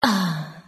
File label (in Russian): vzdoh devushki priyatnij